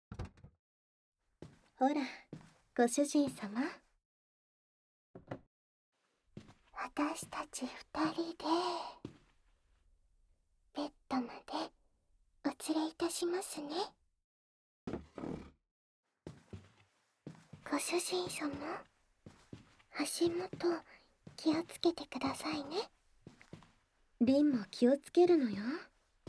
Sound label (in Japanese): 双子メイドによる癒しの両耳舐め寝かしつけタイム; SEあり